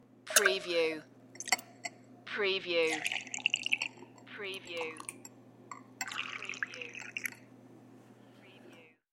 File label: Weak Beer Tap